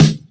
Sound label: warm steel snare drum D# key; snare drum samples